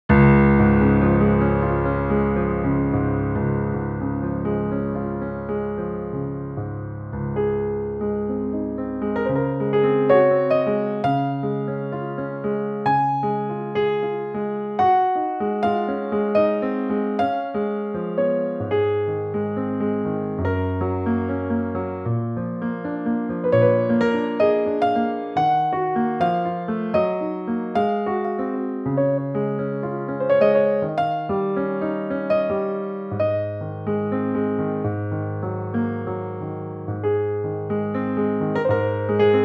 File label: Fantaisie, piano; Waves Grand Rhapsody; Extract